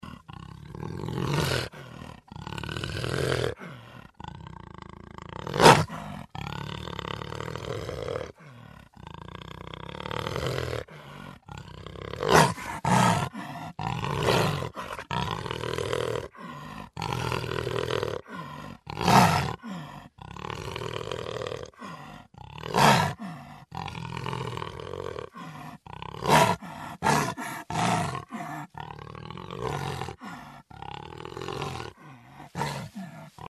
zvuk yaguara

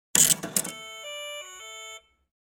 validator biletov

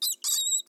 peschanka

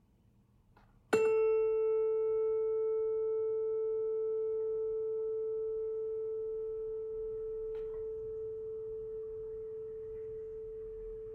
kamerton

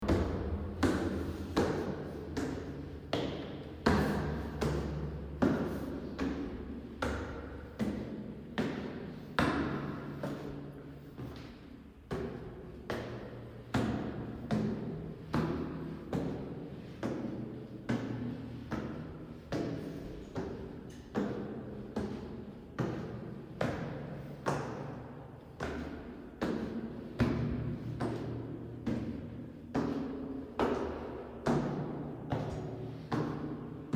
zvuk lestnicy vverh vniz

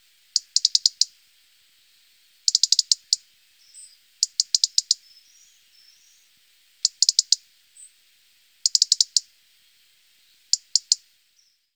zarianka

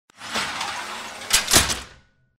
vertikal garaj vorota

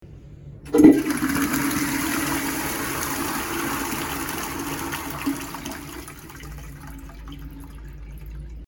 sliv unitaza

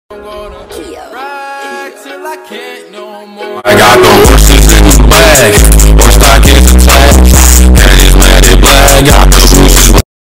muzyka s basami

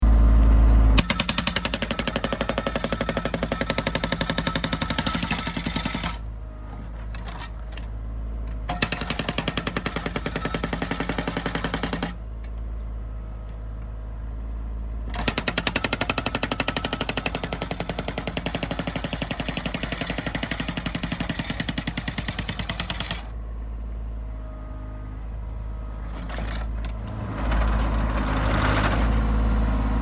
gidromolot na eskavatore, zvuki otboynogo molotka

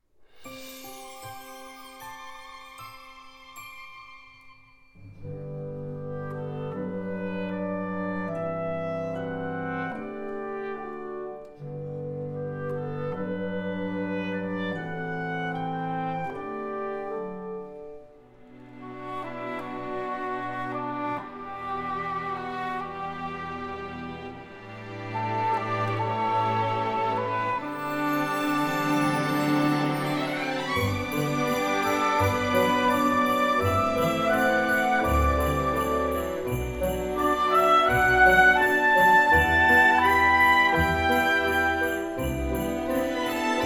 christmas, volshebnaia muzyka dlia fona bez slov

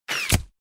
pulia letit i vonzaetsya

